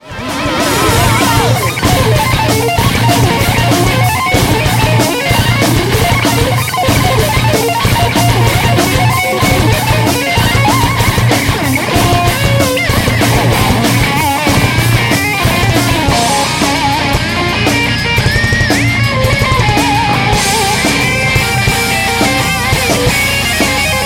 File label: solo